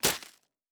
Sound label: Stab